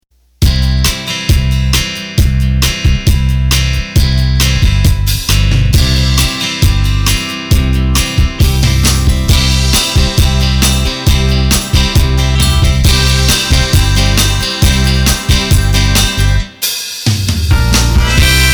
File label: Country Rock, Roland accordion; roland bk7m